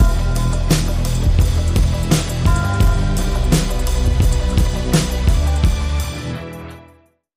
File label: Boom Bap, Link Sting